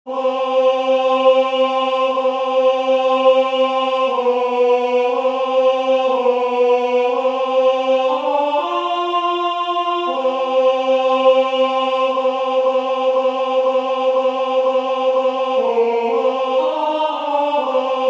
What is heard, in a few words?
rocking tenor, singers